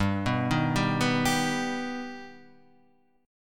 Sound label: G Augmented, chord; G+ arpeggiated; 3,2,1,0,0,3; JGuitar